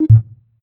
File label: ui error